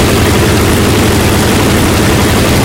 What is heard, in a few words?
b25 side, engines; War Thunder